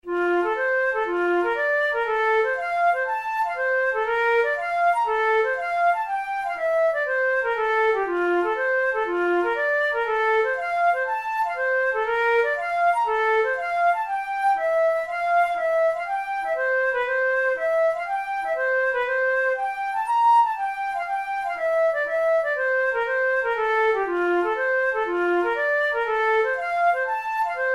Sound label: hornpipe, flute tunes